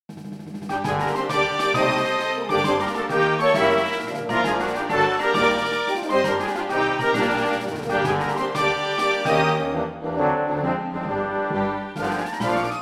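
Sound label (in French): generic actualite orch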